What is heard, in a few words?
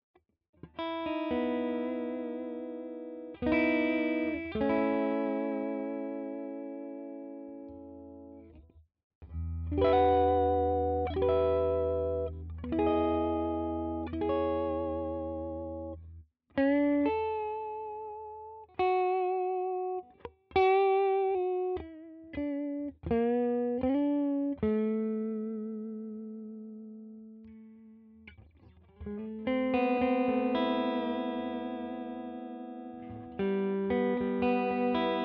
Schecter Vintage PT Fastback II B clean Vibrato all positions